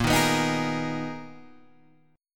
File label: B Major 13th A sharp, guitar